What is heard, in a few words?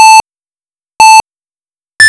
START SIGNAL SQUARE; ScStw; IFSC